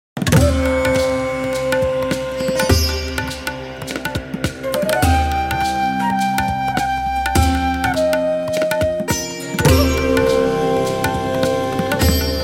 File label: Bansuri Flute; Sandscapes; Andreas Holmebukt; Librewave